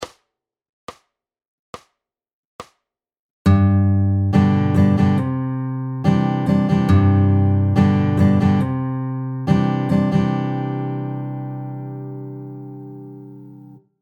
varying strum patterns ballad bass 16th note, guitar